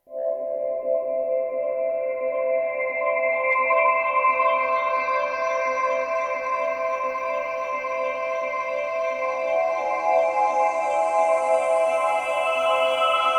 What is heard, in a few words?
Retro Sci Fi Planet Looping